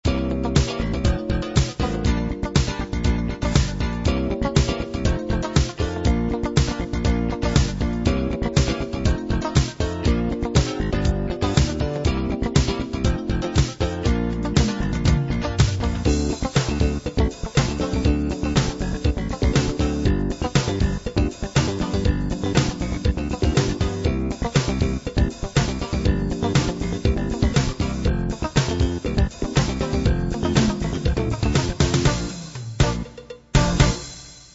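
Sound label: expanded 16 beat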